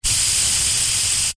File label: household aerosol can spray empty just air; zapsplat